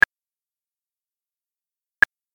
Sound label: click